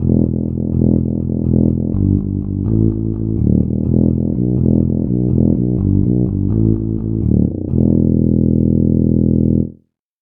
tuba bass

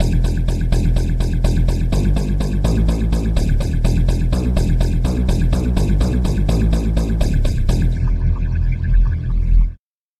chiffer lead bass

